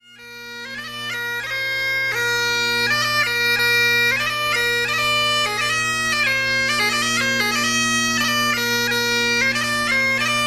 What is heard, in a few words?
Brown hair High Road, bagpiper